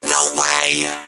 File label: hurt